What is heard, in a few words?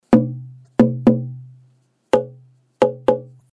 Mixed Hardwood Body